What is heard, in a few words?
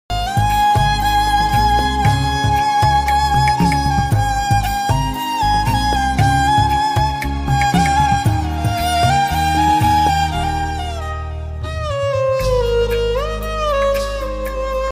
rabindra sangeet instrumental